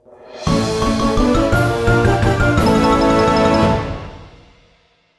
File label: Pvp Jingle Draw